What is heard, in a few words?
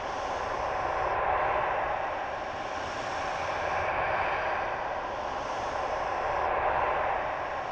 spire plain, ambience